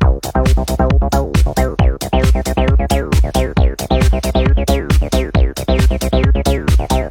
acid loop

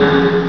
machine

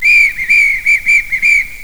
whistle

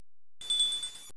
ring in; Embossed

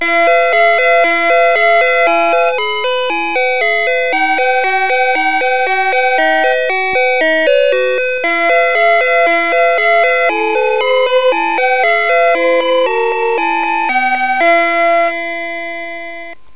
karaoke

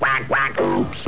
QUACK

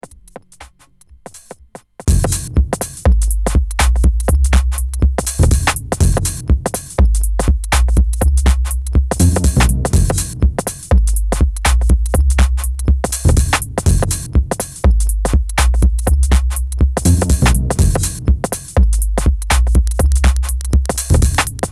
Percussions

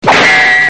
Peeow

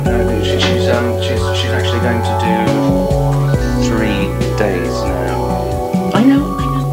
jam loop

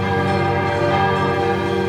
bell loop

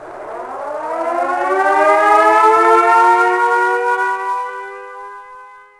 Air Raid Siren

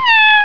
cat meeow

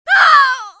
cry

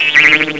BLINK

